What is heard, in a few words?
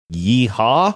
yeehaw